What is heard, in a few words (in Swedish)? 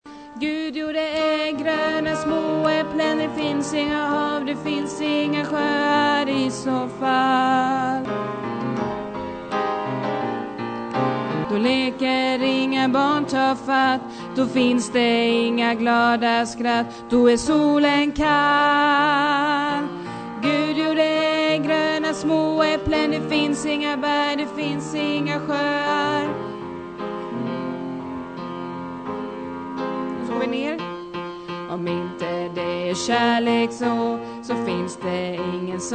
bas, stammor